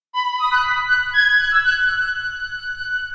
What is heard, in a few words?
Birdy, ringtones; Asus Zenfone-10